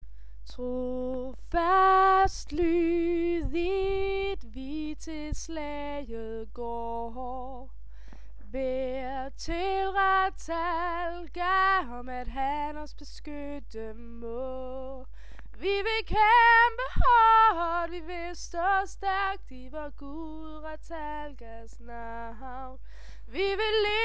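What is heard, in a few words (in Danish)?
Ratalgas hymne, middelalder og middelalderinspirerede sange og musik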